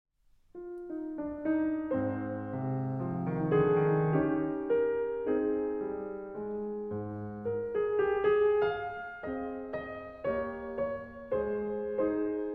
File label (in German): Klavier Trio